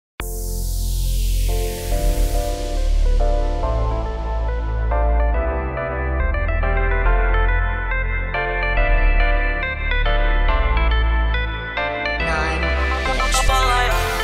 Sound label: remix bass boosted